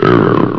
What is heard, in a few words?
pain, flamebot